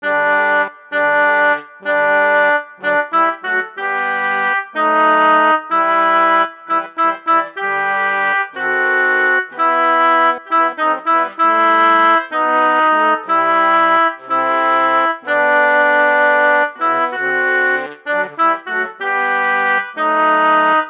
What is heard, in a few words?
Alto; Lift Every Voice